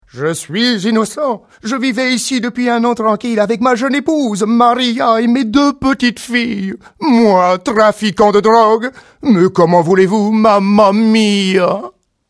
bruit

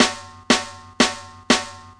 caisse; MOBICLIC 14

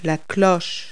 Cloche; Multi-Lingual Talking Picture Dictionary

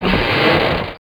Avion; alone in the dark 4

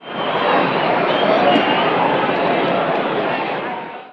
FOULE

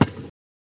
crabe; Emme; Arc En Ciel Et Le Lagon Merveilleux

